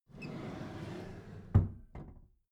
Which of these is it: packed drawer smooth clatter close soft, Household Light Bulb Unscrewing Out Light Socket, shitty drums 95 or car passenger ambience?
packed drawer smooth clatter close soft